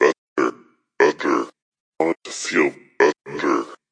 feel better loop; Ze Franks Pain Pack

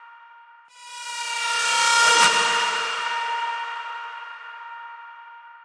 doppler guitar

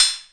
Korg KPR-77

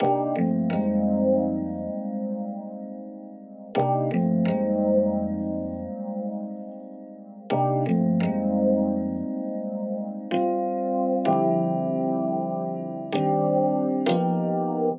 deep chicago house epiano; KEYS ORGAN PIANO LOOPS

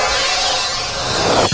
Electro FX; Ueb HE Electro FX

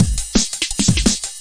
170 bpm bright cymbal jungle

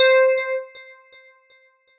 Lead, Synthesizer Middle C Notes; multisampled; synth keys

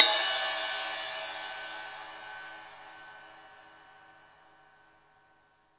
real drum kit; REAL LIVE KITS; A Real Freakin Drum Kit

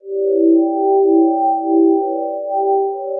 bellpad harmonics; Pads

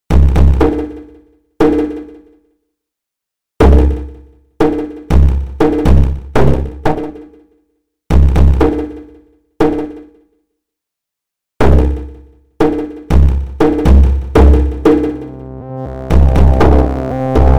Soft Meditation

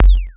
zuper zap

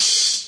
Lofi; classic hip hop; Classic Hip Hop Mega Kit

